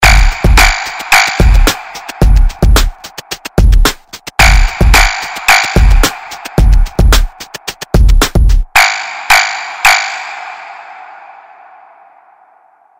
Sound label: Frog Bass